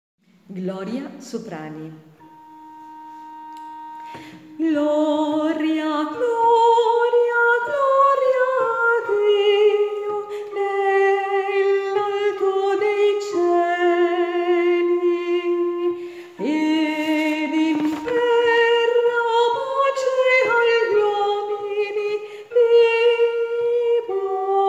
Gloria soprani

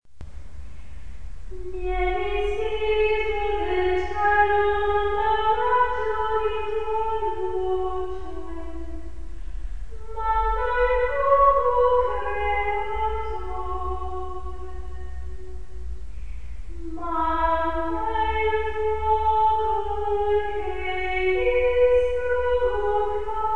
Contralti

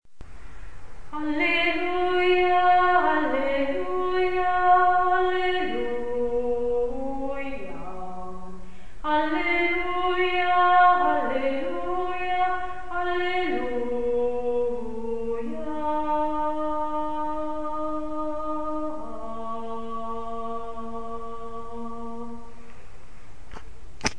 Alleluia voce

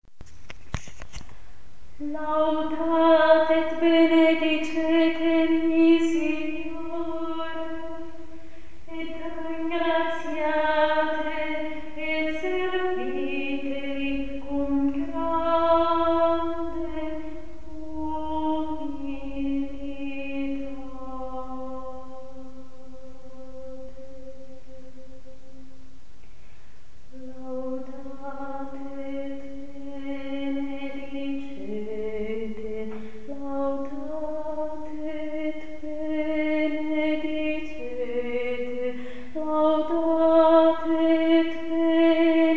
Laudate contralti

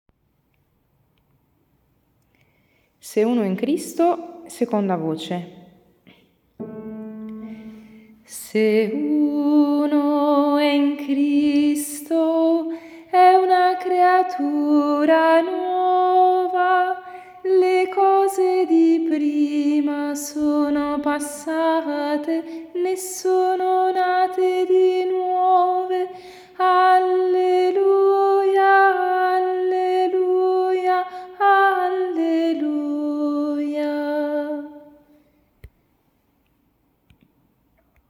Contralto

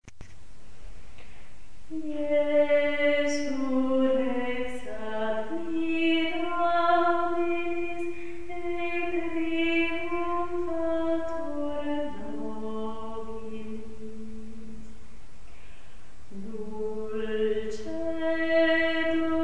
contralti

